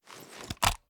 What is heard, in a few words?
sm uzulu reload empty xxmag fast end; gmod; iw8